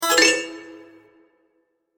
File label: Cartoon Magic Chime; Cartoon Music Sound Effects; Cartoon Music (Sound Effects:Cartoons); SFX Bible 6